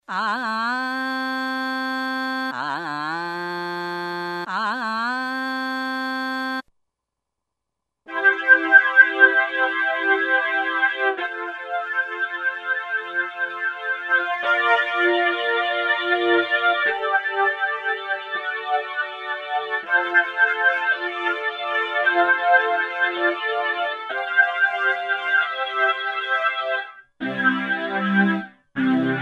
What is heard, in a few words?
VOCODER & FLANGER, Korg Wavestation A-D